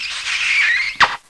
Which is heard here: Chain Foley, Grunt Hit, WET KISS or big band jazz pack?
WET KISS